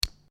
Lego Two Lego Pieces Clack Together; Miscellaneous Toy Sound Effects; Lynne Music

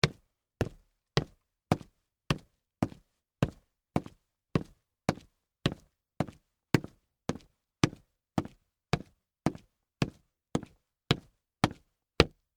Boots March, Concrete; Footsteps; Footsteps (Sound Effects:Human)

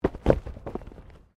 Body movement Impact Roll Road; Body movement foley to simulate falls and deaths; Rolling

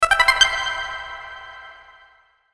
Musical Beep, Musical Beep Ascend Reverb; Miscellaneous Musical; Blastwave

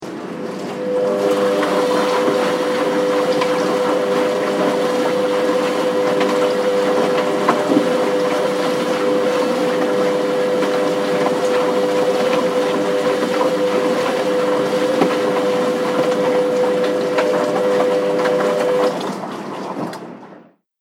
Household Washing Machine; Andrew Fleming

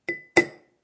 Bowl Spoon Bang, Spoon bangs in ceramicbowl.; Bangs (Sound Effects:Objects)

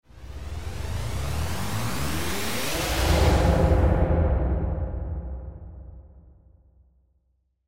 Sci Fi Spaceship; Spaceship Engine Charge Up Warp; Spacecraft

